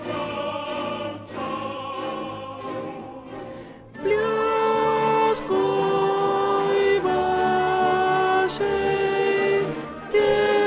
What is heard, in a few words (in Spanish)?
Danza ALTOS; REPERTORIO ÓPERA; Danza Igor